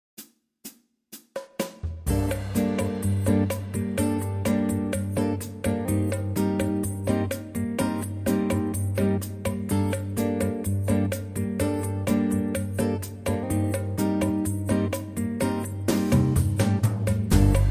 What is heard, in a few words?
Bossa